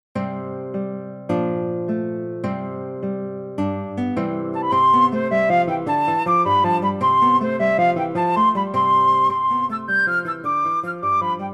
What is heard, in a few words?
flute, folksong; hunting the hare